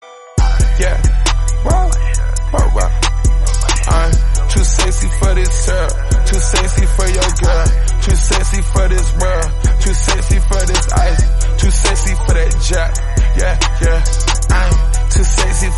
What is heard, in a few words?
new tone